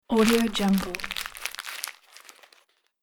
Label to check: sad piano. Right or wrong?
Wrong